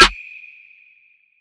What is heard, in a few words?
Weapon Snare